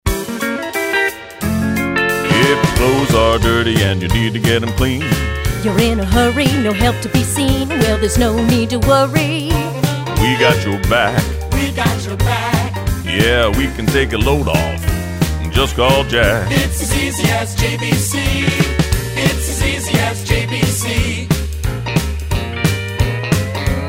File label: Jingle; JackBrown